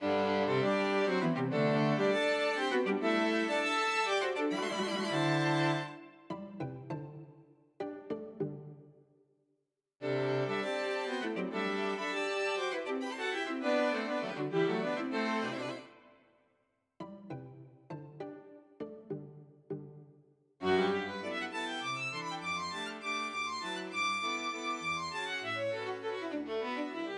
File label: String Quartet IV